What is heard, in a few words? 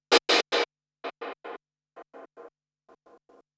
Gated Cymbal; musicradar